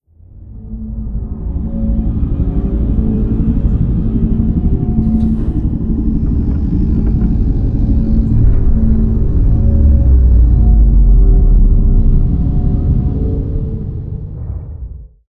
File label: avian colossus closing; apocalypse